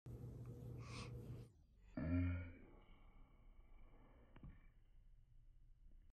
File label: Baby yawning